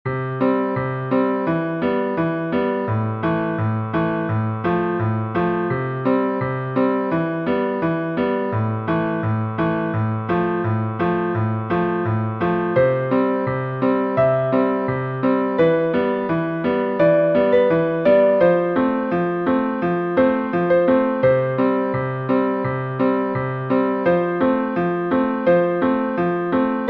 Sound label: piano